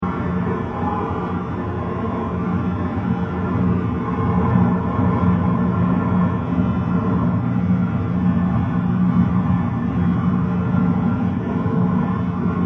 Scary Generator Hum With Additionally Background Effects 120bpm; Scary Soundscape; Crafted Signals